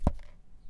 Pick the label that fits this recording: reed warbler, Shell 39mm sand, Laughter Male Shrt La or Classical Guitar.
Shell 39mm sand